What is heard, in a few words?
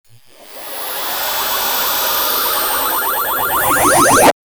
sound of epic time travel